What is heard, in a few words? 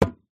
instrument drum gamelan hit single